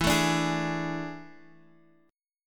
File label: A Major 7th Suspended 4th E